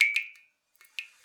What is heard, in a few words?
Drips